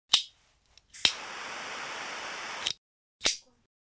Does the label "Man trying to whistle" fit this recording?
No